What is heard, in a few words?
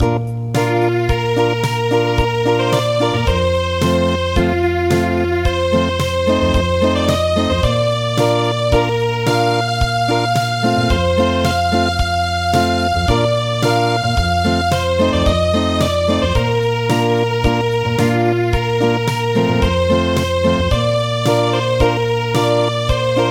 Acoustic